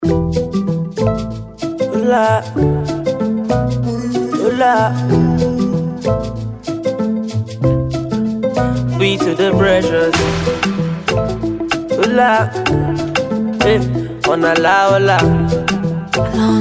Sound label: Instrumental; Preciuz